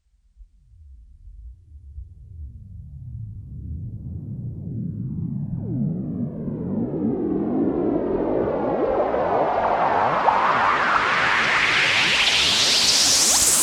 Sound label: Sweeps Long